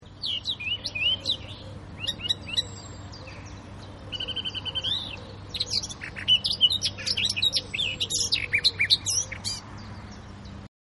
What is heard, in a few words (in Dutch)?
Zwartkop Sylvia atricapilla Geluid; Annelies van Klinken